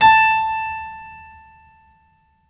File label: HARPSI A5; HPSCHD 4